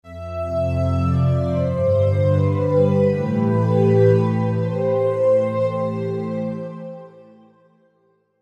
saw pad, Synth string created by SAW Waveform; V-Synth

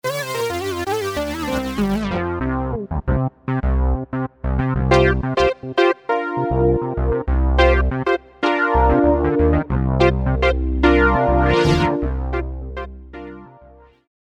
Analog Keys, V-Synth

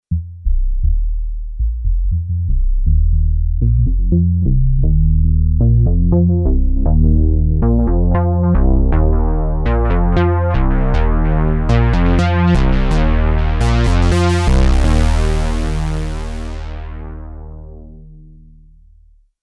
Pedal Bs, BASS; sh-201

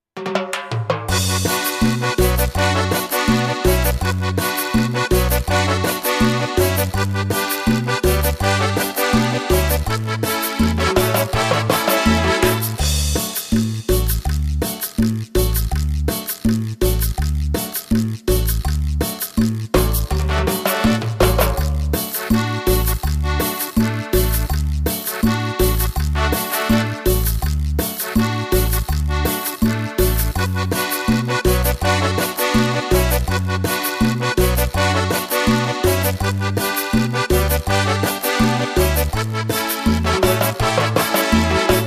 Cumb; LatinAmerica

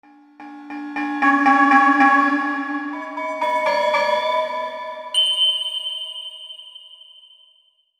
Tamtams; V-Synth

